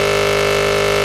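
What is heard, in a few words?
engine exhale, advanced engine sounds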